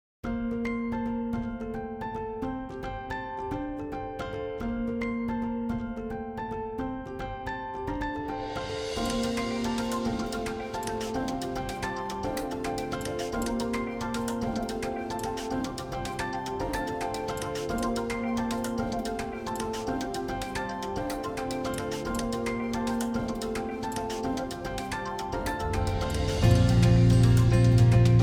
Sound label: inst